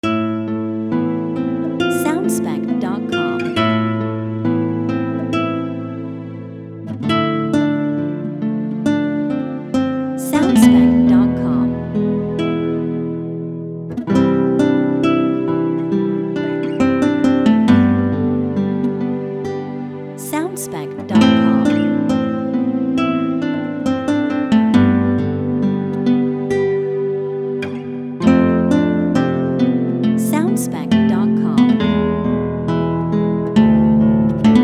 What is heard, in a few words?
Guitar Of The Past Short and faster